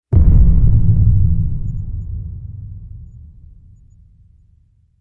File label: Trench Hit Deep; Ninja Tracks